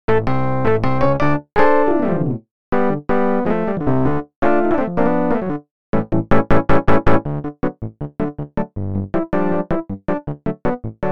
shake a mda epiano

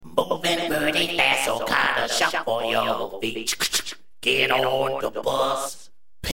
slap delay

